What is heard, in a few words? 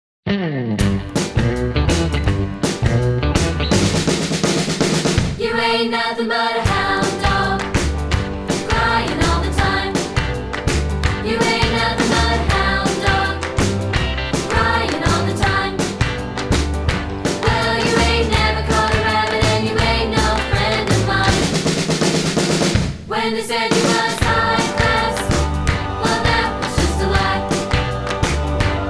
vocals